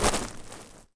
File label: Gravel step